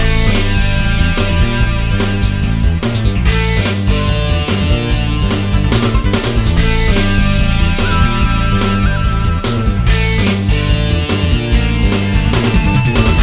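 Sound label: end level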